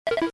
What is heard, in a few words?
notify; Bliss